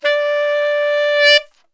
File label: D 3 SAX, Brass Super Section; Best Service